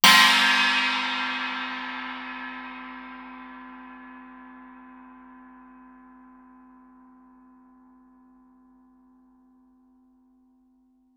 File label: chinese stick normal ff stereo, Suspended Cymbals; Percussion